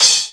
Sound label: Akai XR10 Choke; Drums